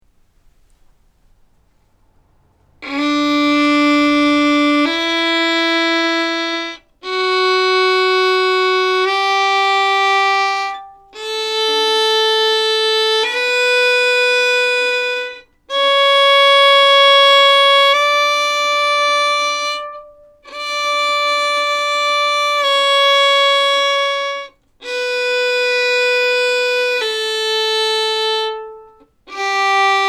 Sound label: Scales 1 octave D slur